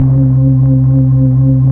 keys Optigan; Roland LCDP09 Keys of the 60s and 70s 1

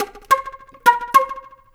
Flute Effect, effected flute

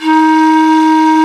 FLT, Sicuri Pipe; Club-50 - Foundations Roland; Flutes +

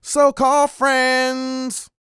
SING, Vocal Planet R&B & Dance; ILIO

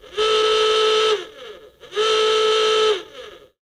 Klaxon, cartoon Foley Fx; musicradar; cartoon caper

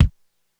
Kick, Korg DSS-1 Drums

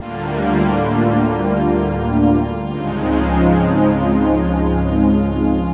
Smooth Pad, Keyboards

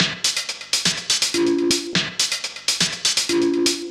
Percussion; Sonic Foundry; Rondo Brothers Trip-Hop Loops & Samples

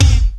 Fairlight CMI III Kick

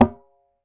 Dhol; Roland BK-5; Percussion; Dholak

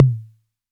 Tom Low, drum kit; Polyend; Stazma & Concrete Collage

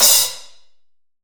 CRS MUTE, CYMBAL

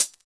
Hhat, HiHat; Equipped Music; Loungin House

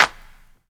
CLAPS HIP, CLP; Best Service Dance Mega Drums

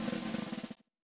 SWNG, SWNG SHFT

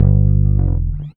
REAL BASS, BASSLICKS; Ueberschall; Jam Box

